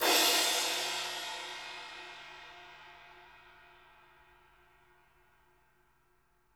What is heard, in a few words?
crash, crashes